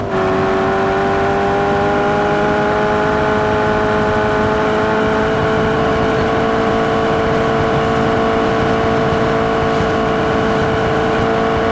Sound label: fourth cruise, vehicles; bmw m3 g80